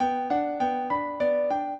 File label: trio, piano; Mozart